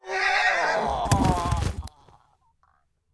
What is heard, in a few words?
horseman die; propack